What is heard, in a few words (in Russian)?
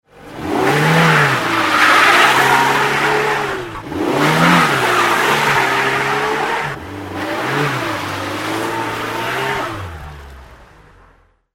zvuki probuksovki